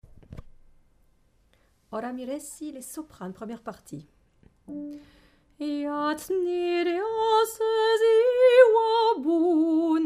Soprano

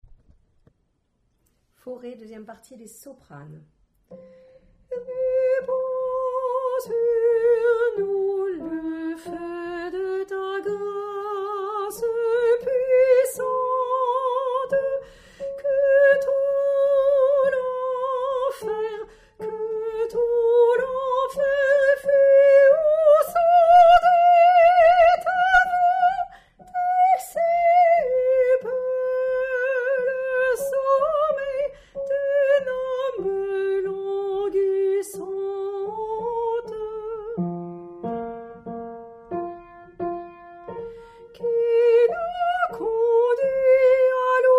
Soprano

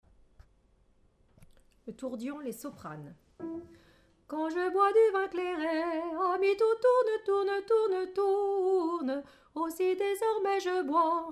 Soprano; tourdion

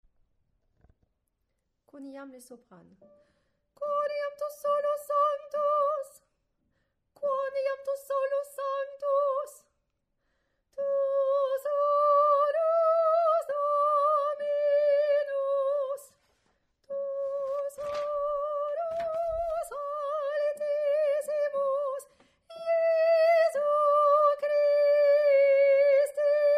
Soprano; quoniam

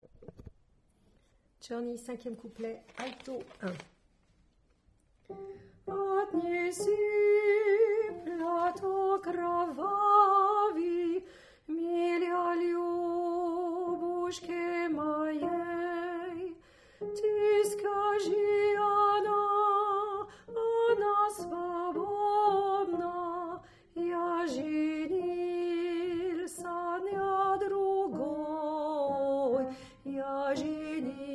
Alto

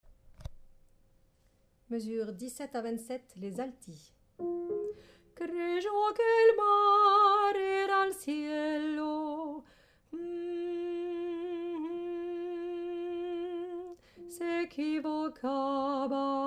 paloma Alto

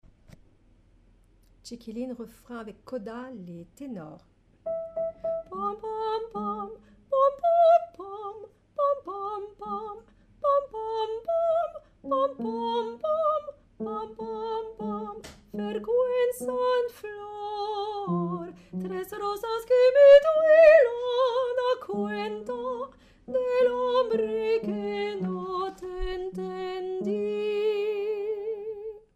Tenor

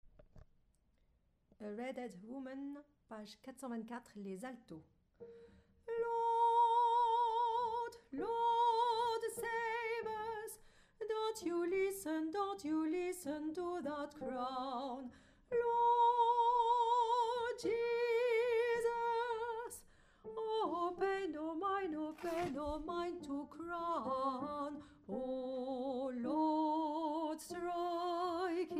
Alto

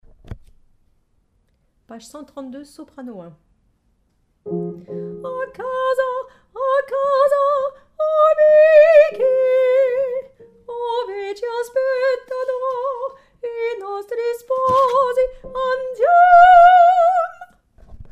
Soprano

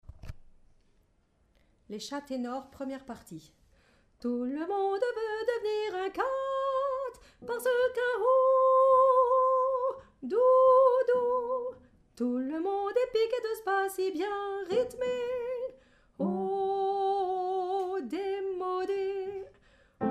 Tenor

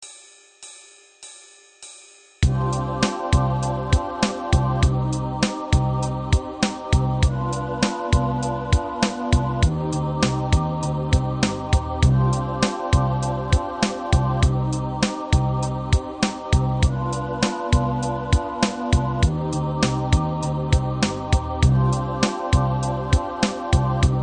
adagio 100 bpm, sweep

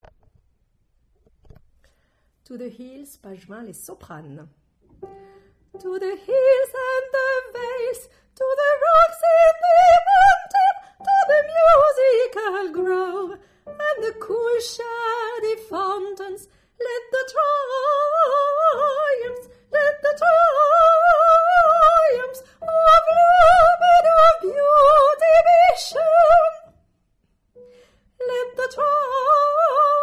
to the hills Soprano

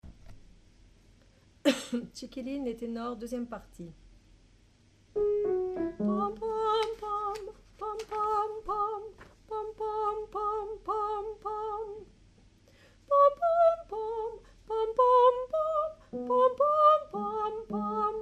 Tenor

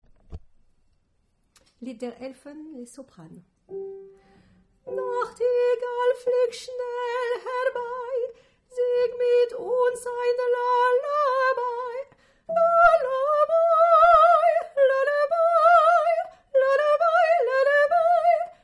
Soprano

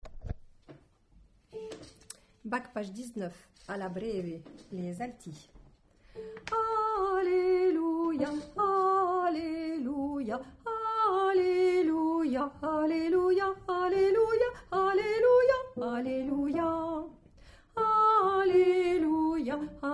Alto

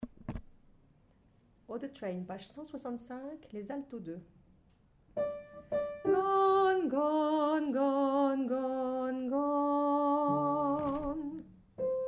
Alto

